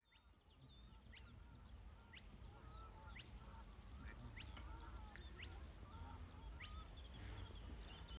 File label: spotted crake